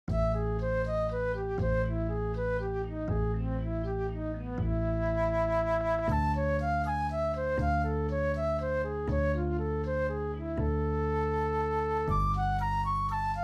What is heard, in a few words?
Augmented scale study play along minor 120